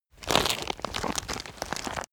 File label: rotten wood crack; foerster